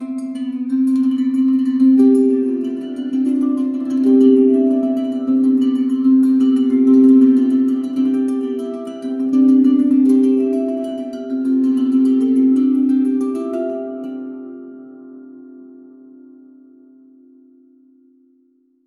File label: gentle acoustic backgroun